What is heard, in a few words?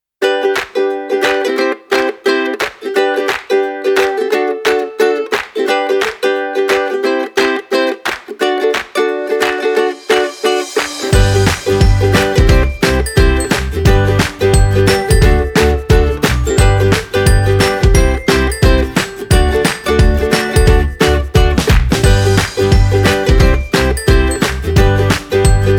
Fun Upbeat Ukulele